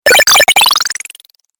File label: Game Console Arcade Power Up, Video Game Sound Effects